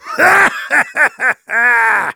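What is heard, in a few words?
laugh happy, German; Heavy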